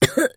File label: cough; 商人杰恩（七日杀）